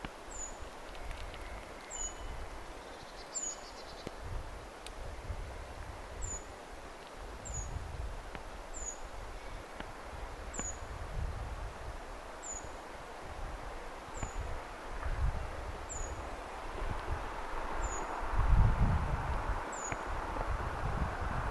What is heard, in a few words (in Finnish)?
ti puukiipijan aantelya; ti-puukiipijä, 1